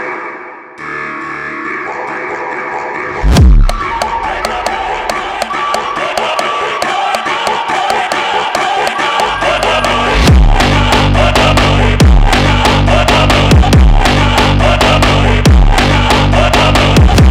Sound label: Ukrainian Cossack Phonk